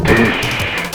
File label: tish